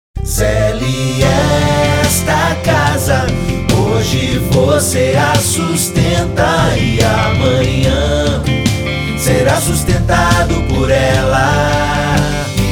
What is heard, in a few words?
jingle